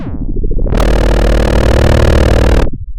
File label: pop, chainsaw